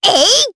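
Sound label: Attack, Japanese; voices; heroes; Miruru; kingsraid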